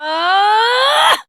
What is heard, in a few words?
Abil Exert Adult, en; Warframe; Duviri; Drifter; Melee